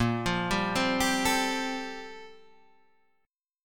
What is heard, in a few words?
G Minor 11th A sharp